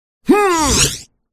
mage male attack voice middle

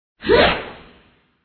black widow attack voice middle

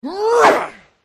human male attack voice up

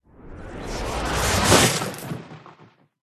sea monster buff

